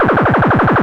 Fm - 96, synth; monodelay; synth loops

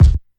original bass drum sound d key; kick

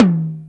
low mid tome drum sample e key

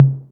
deep tr 808 electronic tom sample c sharp key

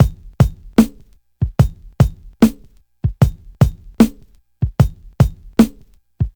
75 bpm breakbeat c key

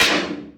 Old School Subtle Reverb Hip Hop Snare Drum D Key

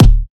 good kick d sharp key; kick drum samples; one shots

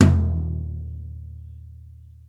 long tail reverb tom sound e key; tom drum; tom drum samples

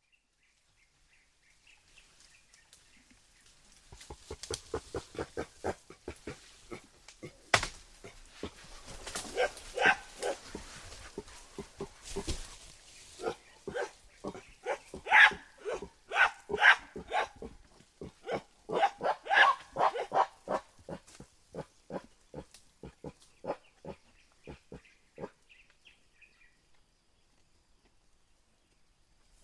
mountain gorilla; soundscapes

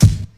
sharp kick single hit G key

